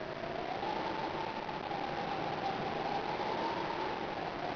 hurricane

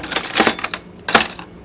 kitchen drawer

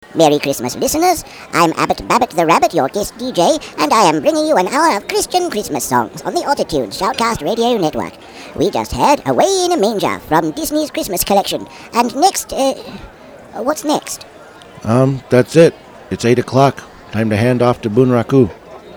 chritmas songs

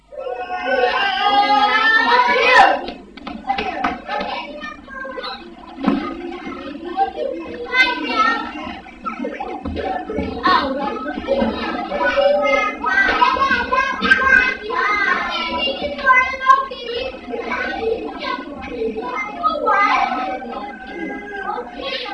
kids indoors in hall

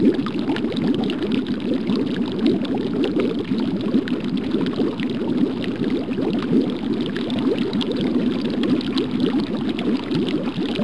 boiling bubbles

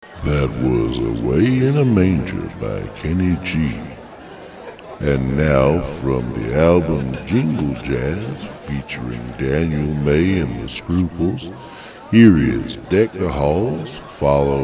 christmas jazz

